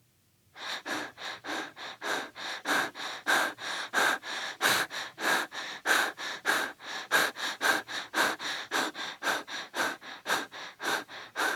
Young Boy Fast Breathing For Running

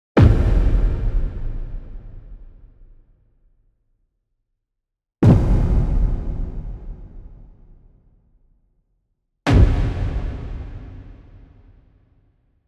Cinematic Hit Impact

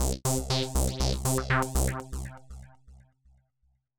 Epoxy rave 120 bpm

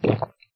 Drinking Gulp

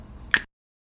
Snap

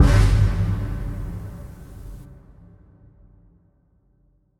Kick Drum; Terminator

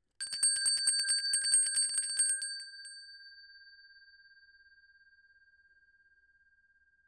Ringing A Metal Bell

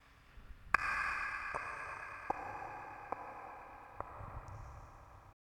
Cave Pebble Falling Down Echo

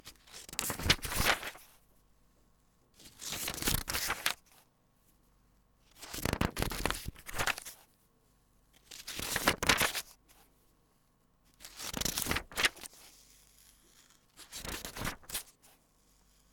Page Turning

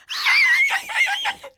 Scream

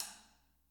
Prac Perc

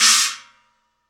China High Choke

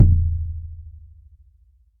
Shaman S Drum Very Low

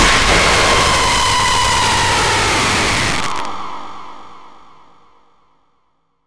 Jump scare

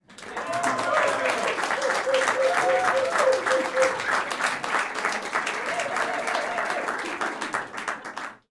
Small audience claps male yeah

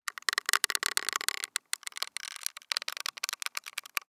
Stretch Long

